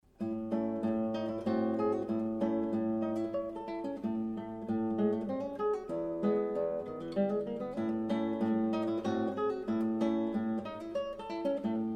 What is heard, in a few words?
Polonaise Moderato, guitar; Zwei Polonaisen Und Mazurka Opus 3